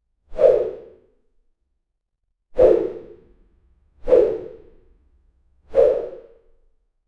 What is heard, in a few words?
arrow whoosh